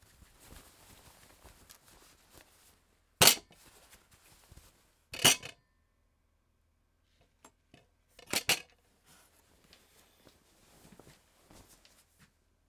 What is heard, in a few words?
Potatoes On Plate